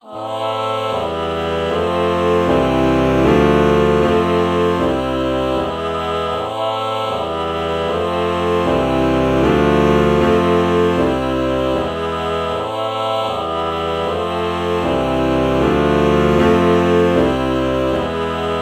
alto saxophone ah chord morph

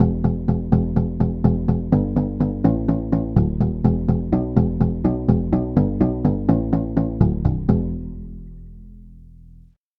banjo bass

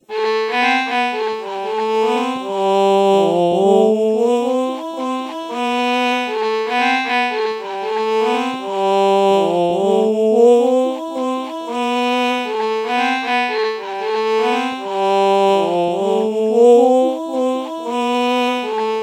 violin lpc true melody morph low

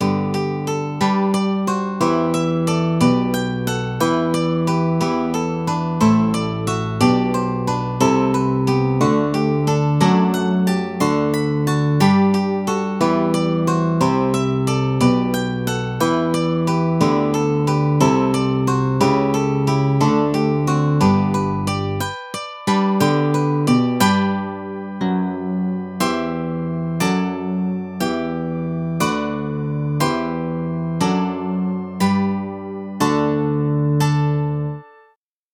nylon string guitar bach samples

